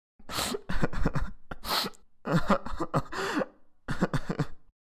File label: Male adult crying and whimpering